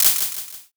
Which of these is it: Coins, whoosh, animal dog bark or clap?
clap